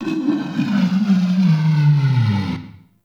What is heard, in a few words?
Forest Animal Mid; Ambience